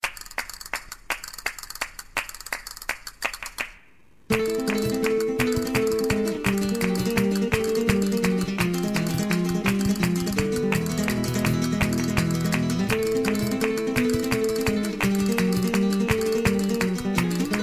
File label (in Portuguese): Cigano Flamenco guitara; radio gira de cigano